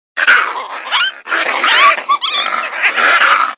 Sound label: Coyote & Grey